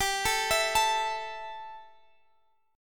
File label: A 5th G, guitar chord; A5/G arpeggiated